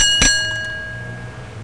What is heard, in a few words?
gas bell